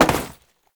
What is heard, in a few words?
Step Metal Perforated; Warframe; Oraxia; Foley